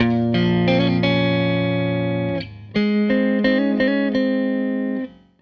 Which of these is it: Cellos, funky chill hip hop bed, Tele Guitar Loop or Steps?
Tele Guitar Loop